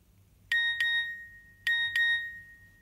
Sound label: SMS ringtone